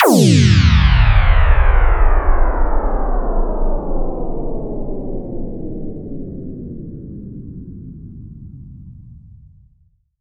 FX Sweep Down; Vengeance Samples

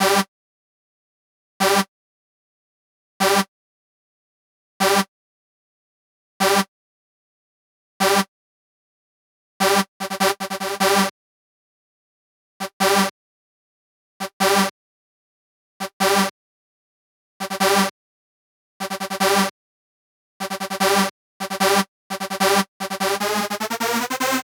Tune Up G; Vengeance Samples

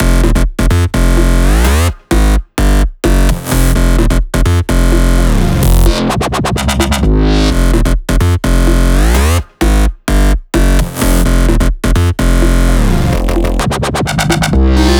128BPM Melody Root F; Vengeance Samples; Body Kit

